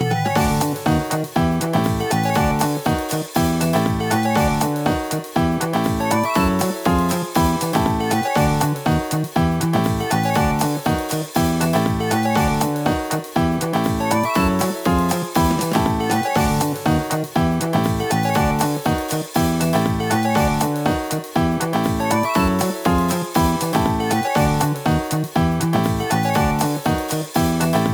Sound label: slot machine; yoshis